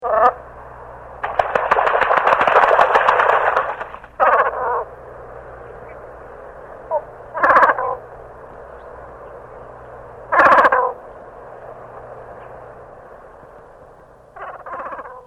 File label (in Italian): marangone dal ciuffo; faunistico